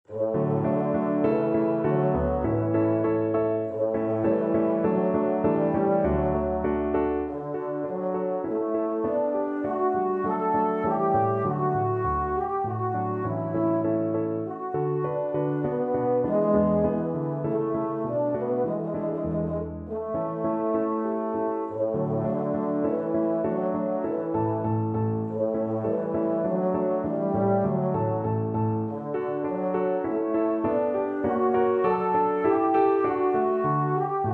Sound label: euphonium